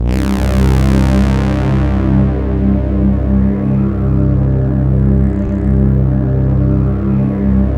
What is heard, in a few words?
SYN K-2000, Roland